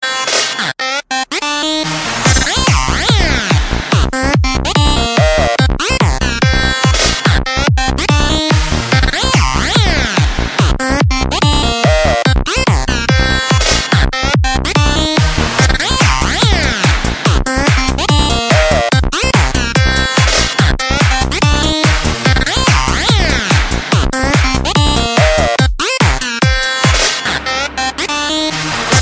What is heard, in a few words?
Psy Trance